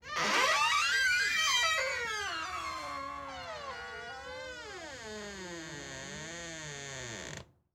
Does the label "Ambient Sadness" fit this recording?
No